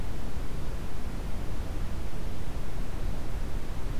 Rumore marrone

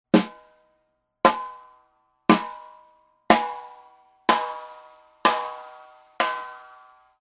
PC Armonici

